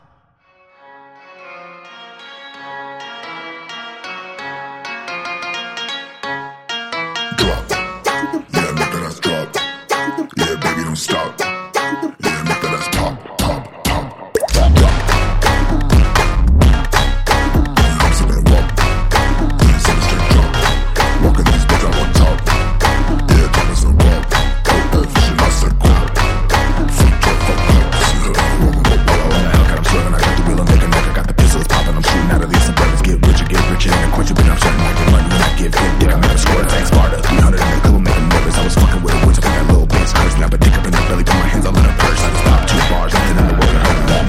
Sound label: Phonk Music; فانک phonk